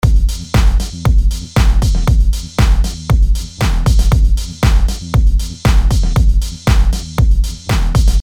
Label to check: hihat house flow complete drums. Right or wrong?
Right